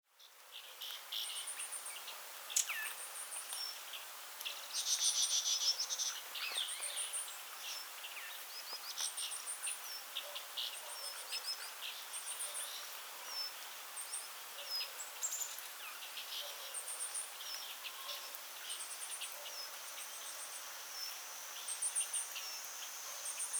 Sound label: birds in the forest near city binaural; alishan